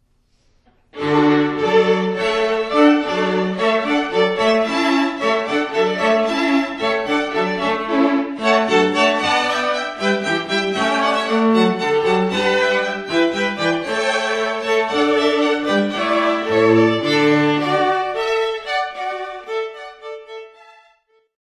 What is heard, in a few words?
hornpipe